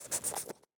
fox sniff; mob; minecraft

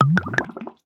brew, brewing stand; Minecraft

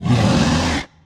polar bear warning; mob; Minecraft

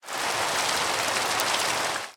rain, weather; ambient; Minecraft

